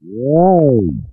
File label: woah; Zaps and Beeps